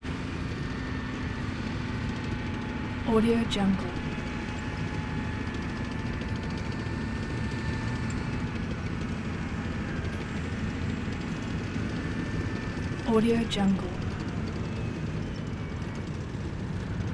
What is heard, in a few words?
military vehicles many pass by in review